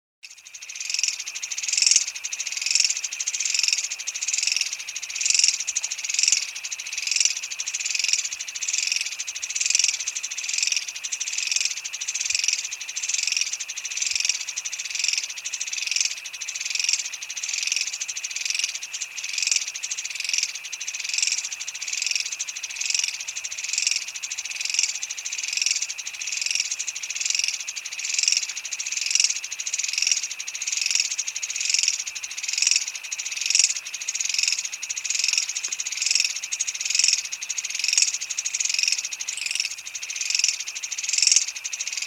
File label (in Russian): gruppa krikov v dikoj prirode